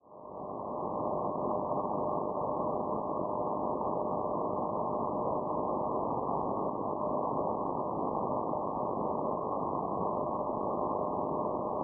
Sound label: NDB 300 kHz; PV; Novi Sad; Serbia; Perseus; Schwarzsee